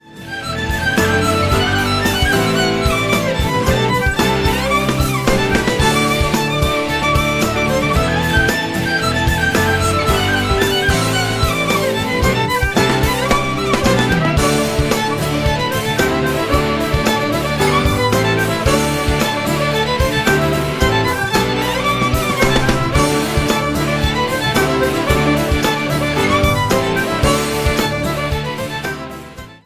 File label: Irish Medley